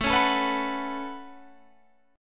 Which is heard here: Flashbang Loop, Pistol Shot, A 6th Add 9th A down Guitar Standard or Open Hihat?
A 6th Add 9th A down Guitar Standard